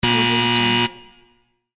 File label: wrong answer